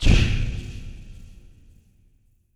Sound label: hshshsh, Swish